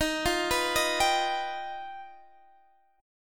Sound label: G Augmented 7th